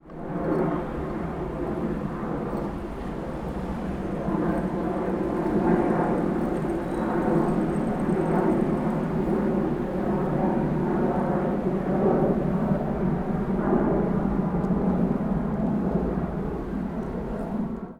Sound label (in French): avion